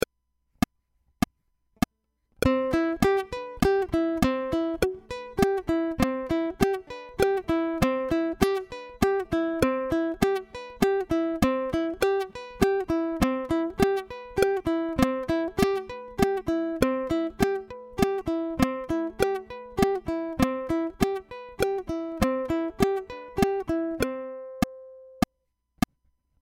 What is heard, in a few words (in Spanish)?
C Escala Back Click